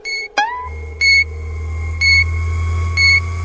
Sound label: airy beep and ding